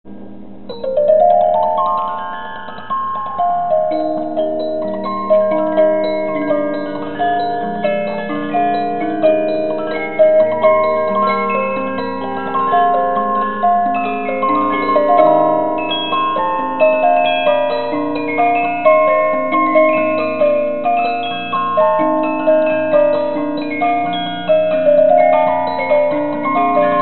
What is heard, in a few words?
musicbox